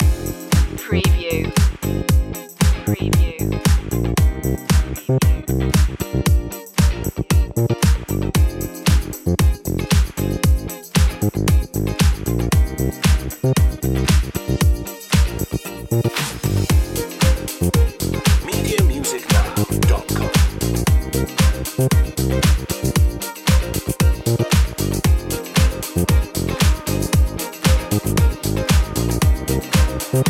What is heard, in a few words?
start up groovin